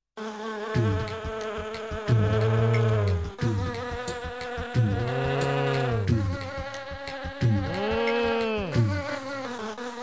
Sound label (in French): mouche